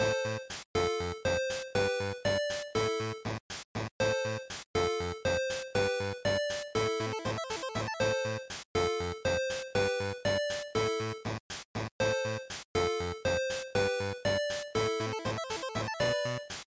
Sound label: Dungeon Loop; GB; RPG